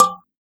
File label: oxaca side, percussion; ILIO